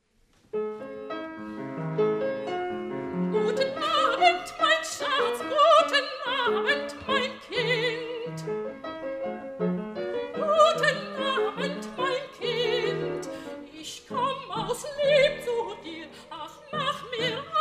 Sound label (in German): klassisch strophe; brahms